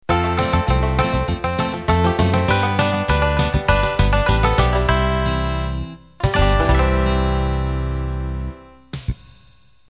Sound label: Cajun Country End